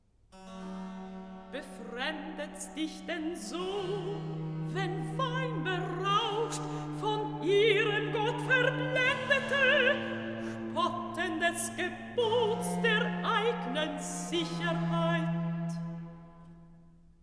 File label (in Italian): Recitativo; Cyrus; Befremdet's dich denn so; Handel Masterworks; Klassika